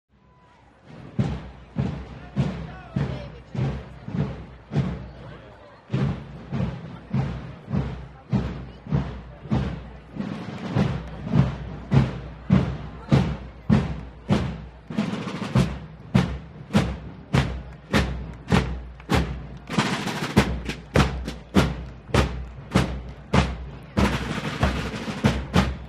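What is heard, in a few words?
Parade Ambience Approach; parades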